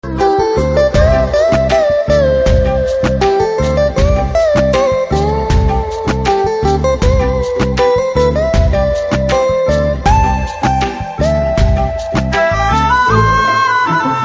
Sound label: Instrumental BGM Telugu Ringtone; Uppena